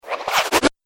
Droid Grappling Hook Retract, robot

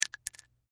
Bullet Shell; Bullet Shell 223 Drop Cement; Drops (Sound Effects:Objects); Blastwave; Warfare

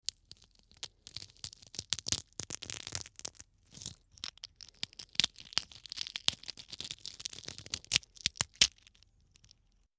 Eggshell crack, Egg Shell Cracking; Eggshell Is Cracked And Peeled; Food Prep; Restaurants

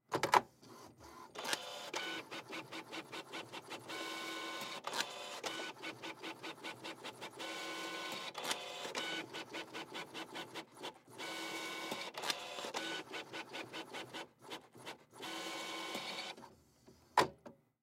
Label Printer; Label Printer Industrial Print Multiple Pages; Printers; Office; Industrial Print Multiple Four Pages; Blastwave

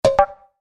Chime Percussion; Multimedia Web Interactive; Musical; Technology; Blastwave

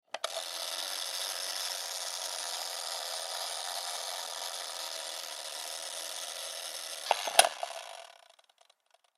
Timer Hotel Heat Lamp On Run Off Click; Lamps; Industry; Blastwave

